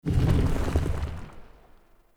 Stone Pedestal Stop; UnderCity

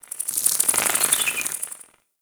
Narmer Outpost Winged Insect Passby; Warframe; The New War; Ambience

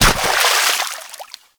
Bird Of Prey Bodyfall Water; Hunting Critters

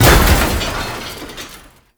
Throne Debris Impact; Roathe; Warframe; Tau

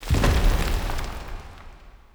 Shrine Temple Door Close B

